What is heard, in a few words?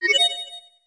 power meter refill